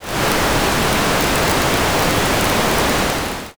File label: fire